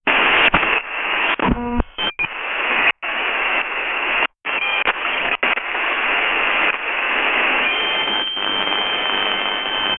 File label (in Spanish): sonido de comunicacion de; communication